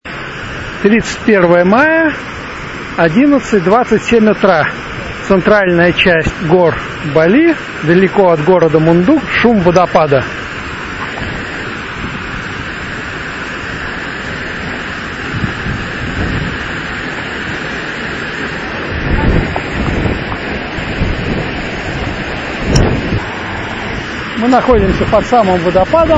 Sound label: indonesia sounds; geopoesia